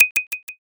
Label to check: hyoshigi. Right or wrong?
Right